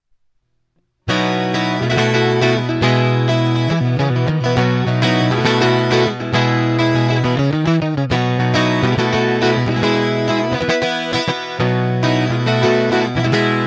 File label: bouzouki